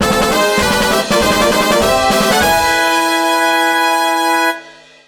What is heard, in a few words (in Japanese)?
個人賞ファンファーレ; River City Melee Mach; PS4